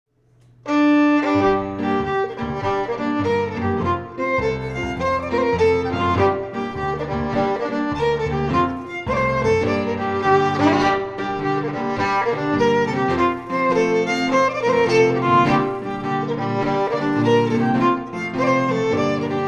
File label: Jigg FIDDLE PIANO; John Macglashan col 1796; The Jink & Diddle School of Scottish Fiddling